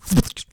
Bullet Hit Player; liblast; Weapons

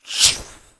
Rocket Launcher Shot; liblast; Weapons

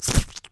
Bullet Hit Player; liblast; Weapons

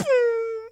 Weapons Bullet Hit Wall; liblast

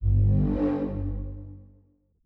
UI Accept; liblast

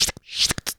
Handgun Reload; liblast; Weapons

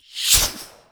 Weapons Rocket Launcher Shot; liblast

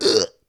Player Pain; liblast

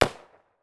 Handgun Shot; liblast; Weapons

